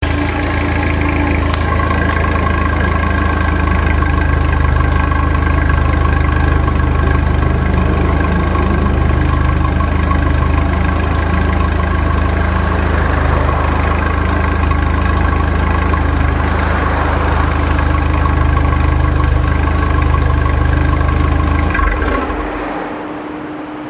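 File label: muffler center idle; Giulietta; unicorse-italial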